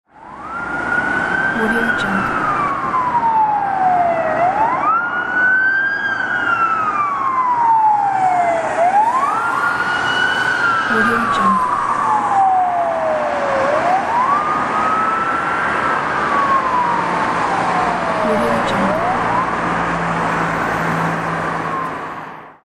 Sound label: ambulance passing with siren on